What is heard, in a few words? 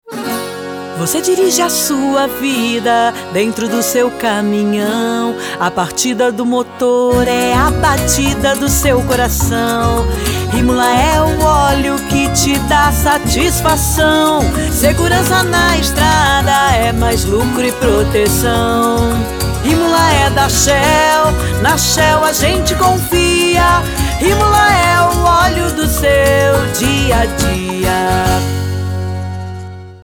JINGLE; RIMULA SHELL